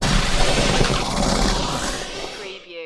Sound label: Alien Growl Movement; sound effects bible